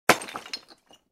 Звук камня который бросают

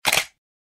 DSLR Камера

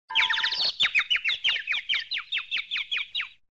Звук птичек после удара по голове

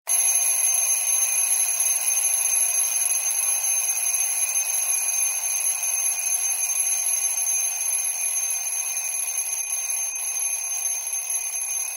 Звук механического будильника

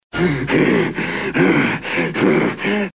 Звук рычание злого мультяшного персонажа

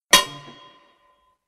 Звук удара железной лопатой о камень

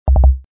Звук вход не получился

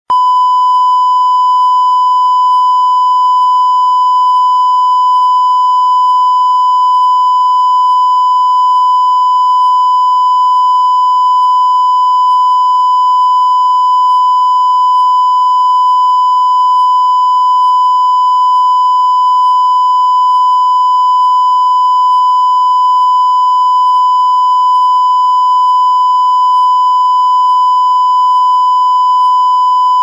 Звук Цензуры The sound of censorship долгое длинное

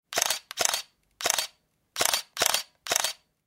Многократная съёмка на фотоаппарат

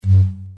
Звук ошибки

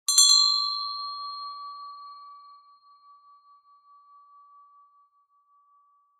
Тряханули большой колокольчик

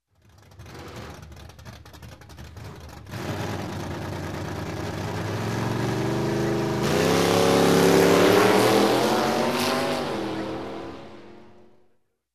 Спортивный автомобиль заводится и стартует Sports car starts and leaves

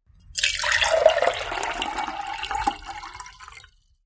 Жидкость наливают в стакан

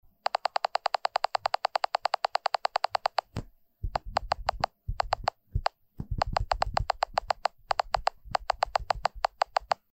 звук стирает текст на iphone

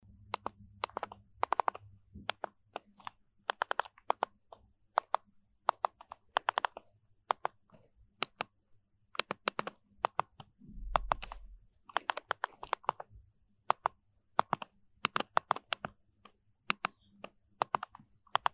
Звук набора смс на старом китайском мобильнике

